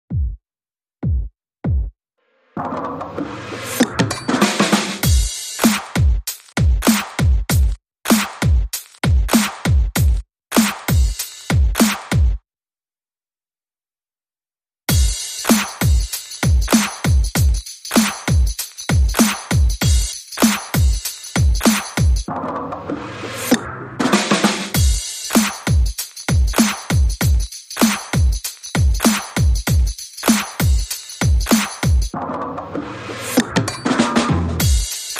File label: Drums Only